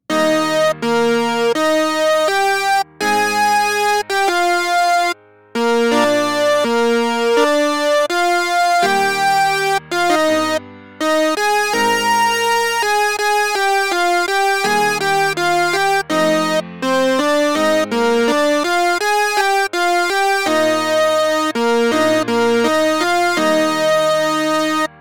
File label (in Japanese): コード進行音源; メロディと編曲の関係性